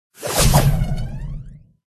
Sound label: griphon buff, pets; pet grifon